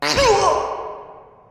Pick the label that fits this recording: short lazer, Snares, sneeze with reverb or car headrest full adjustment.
sneeze with reverb